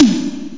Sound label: crypt tom